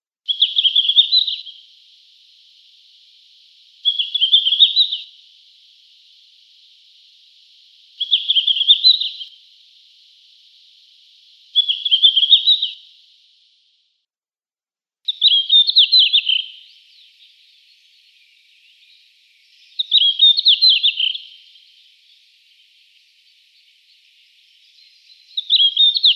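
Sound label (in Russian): Sinehvostka, red-flanked bluetail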